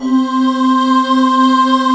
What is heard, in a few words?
DIGI WAVE, DIGI PAD; AKAI S6000 CD-ROM - Volume 1; SAMPLE WAVE MIXING 2